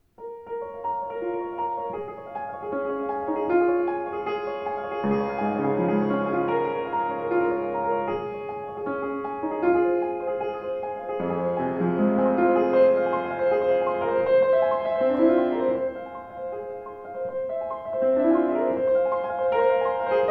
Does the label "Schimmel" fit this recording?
Yes